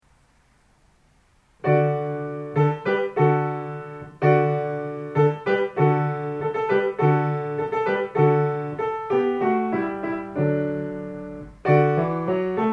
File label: Hallelujah Chorus Easy